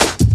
New Wave Cut 1, Drum Loops, 167.5; Jungle; Zero-G Jungle Warfare 1, 2 & 3; 90 s Sample CDs

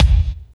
KICK, Tekno Industrial; East West

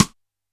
Side Stick, Standard Kit, Yamaha PSR-E323

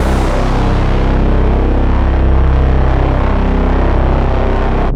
SYN BASS

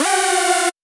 Synth, DnB One Shots

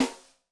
Metal pic, Yamaha DTXpress-II snare

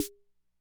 Snare, classic machines; arranger

